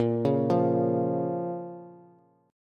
Bb Minor Bb down Bass; arpeggiated; JGuitar

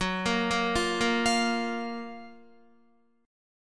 Gb Major 7th Gb Guitar; GbM7 arpeggiated; JGuitar

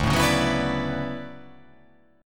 D Major 13th D down

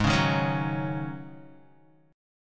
Ab 7th Suspended 4th Ab Guitar 4F,4A,5C,5G,5C,6E; Ab7sus4; 3,4,3,6,6,x; strummed; chordshape; JGuitar

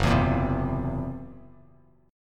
Fsharp 7th Flat 5th down Bass Standard; strummed; JGuitar

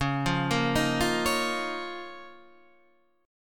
C sharp 6th Add 9th C sharp down Guitar DADGAD

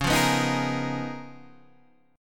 C sharp 13th C sharp, Guitar, DADGAD

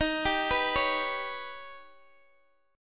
Eb Augmented 7th, Guitar Open G; x,x,13,12,12,11; down

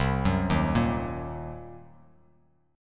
C Minor 7th Flat 5th, Bass Standard; arpeggiated; JGuitar

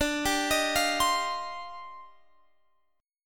Eb Major 13th D, guitar

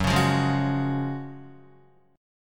Gb Major 7th Suspended 2nd, Guitar; GbM7sus2 strummed; JGuitar

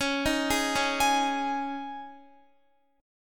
Db Suspended 2nd, Guitar; arpeggiated; 4E,4A,5D,5G,5C,6F; Dbsus2

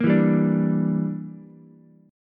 Bb 6th Flat 5th, Ukulele; Bb6b5 strummed; 3,4,3,5; JGuitar

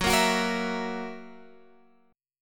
F sharp 7th, Guitar, Open G

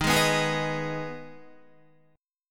Eb Suspended 4th Sharp 5th, Guitar; strummed; chordshape; 10,11,11,13,11,11

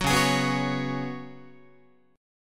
Db 7th Suspended 4th Db down Guitar; strummed

